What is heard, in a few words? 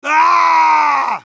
heavy jeers, es; ¡Aaaaaaah!; Respuestas del Heavy